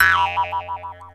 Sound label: Jaw Harp; musicradar; cartoon caper